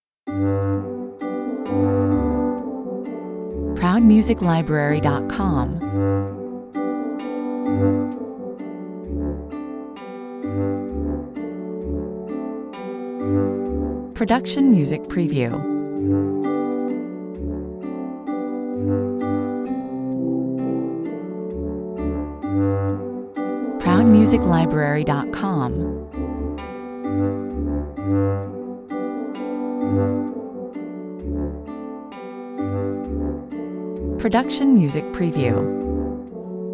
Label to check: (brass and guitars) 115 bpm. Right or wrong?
Right